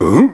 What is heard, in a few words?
Huh; Neighbours From Hell Console Edition; GC, Xbox